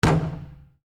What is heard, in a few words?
Basketball Rim, Sports, Bounce